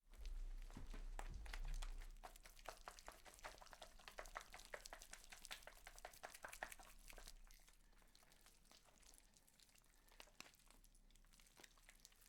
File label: carcass shake rib cage